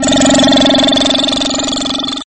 Weird power

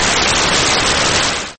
Outdoor lawn machine sfxr

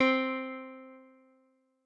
C elec guitar; turtlestitch

